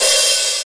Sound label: bright open hi hat sound c key, Royality free open high-hat tuned to the C note